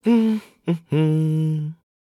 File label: voice humming